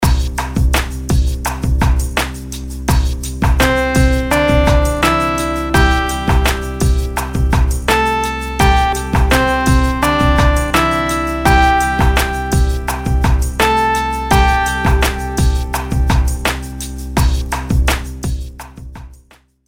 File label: melodies simple Cmaj; 4s; melody